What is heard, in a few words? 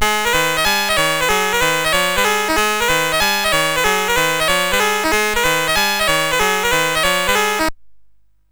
sid CSG8580R5; Metro-Cross